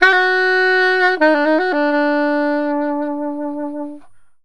SAX, Balkanish Traditions

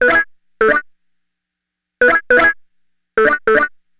Guitar Hits; Sonic Foundry (Sony Creative Software); Stylus Pressure Urban Grooves on Digital Wax; Wiggle Disc

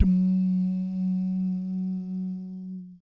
BS DUMM, Vocal Planet Jazz & FX; ILIO; BASS DUMMS

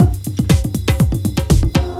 talking head, Deep House 120bpm

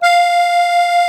HOHNER, akkordeon

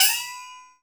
PAN, METAL PER; AKAI S6000 CD-ROM - Volume 1; PERCUSSION

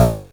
Drums, Hits; DCM8

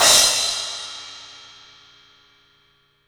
Studio Cymbals; E-MU Producer Series Vol. 5 – 3-D Audio Collection; 3D Percussives

